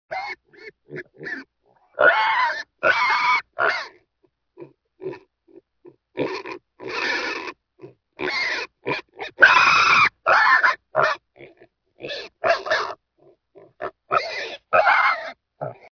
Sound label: Pig squeeling